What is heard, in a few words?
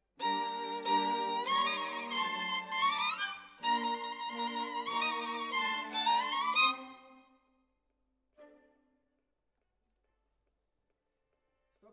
Violin Solo